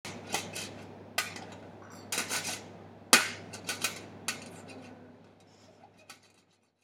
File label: creaky knee